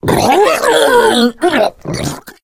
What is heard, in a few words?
female boomer shoved; player; voice; source engine; left 4 dead 2